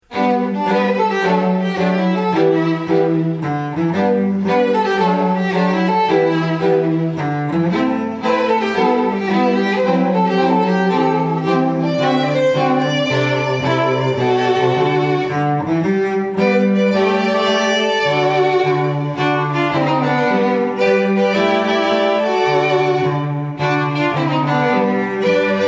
strings

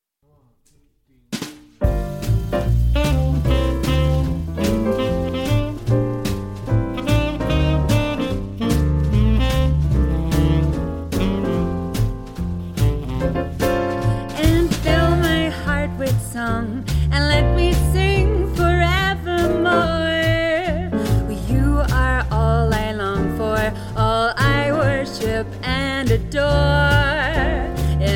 jazz

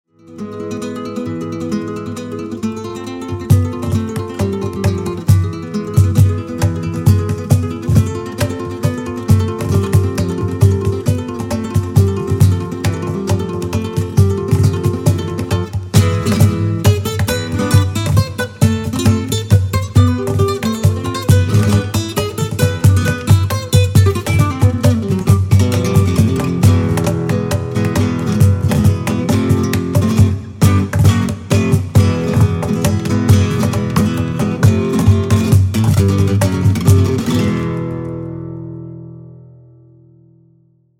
flamenco duo

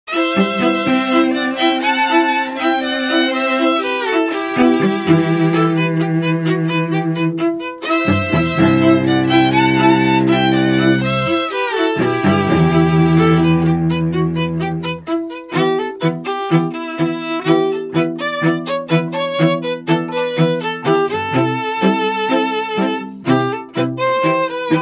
strings

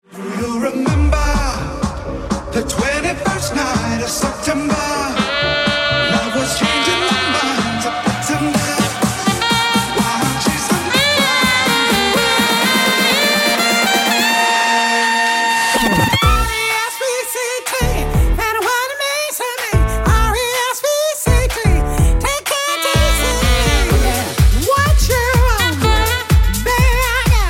sax, wedding mix

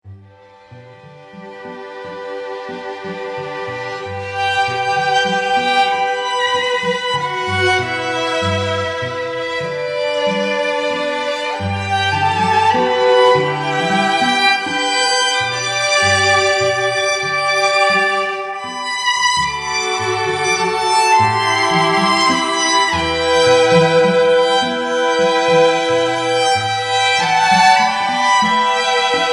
strings

